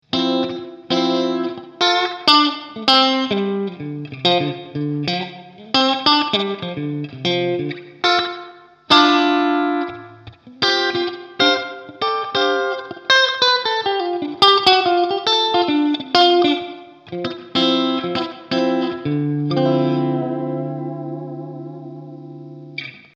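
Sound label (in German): Ernie Ball Expression Tremolo nur Hall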